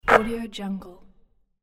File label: basketball swish through net